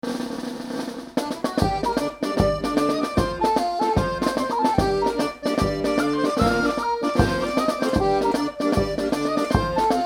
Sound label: Jig